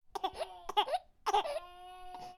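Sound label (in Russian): кашель сквозь плач у ребенка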